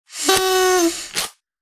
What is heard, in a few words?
party blower; taunt; terrytarget